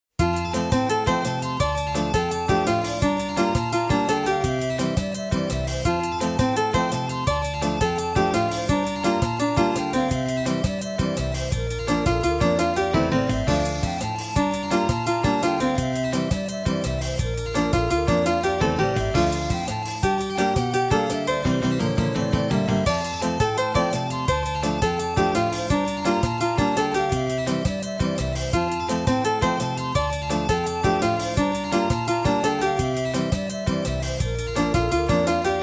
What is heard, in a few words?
bonus bgm; mappy